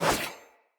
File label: Sword Whoosh L to R; Weapons; Player; Swords; Fey Sword